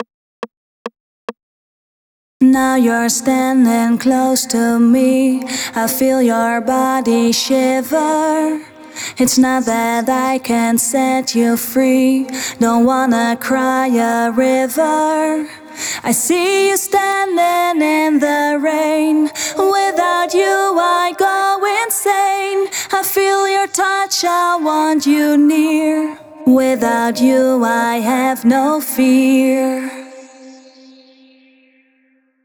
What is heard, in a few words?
Vocals Heavy FX, 140 BPM; Vengeance Samples; No Fear